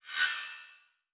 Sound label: Multimedia Internet CD-Rom Flash Whoosh Short